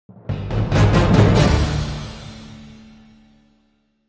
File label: Dramatic Spear Sting